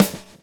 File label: groove; AMG Gota Yashiki Groove Activator; 119GROOVE119